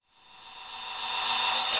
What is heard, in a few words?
Reverse Cymbal; Vengeance Samples; VEH1 Cymbals